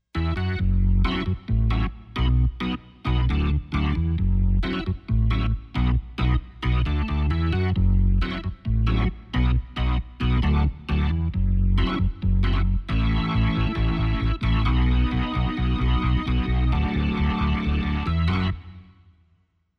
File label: Korg Pa5X VOX Organ